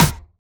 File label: Percussions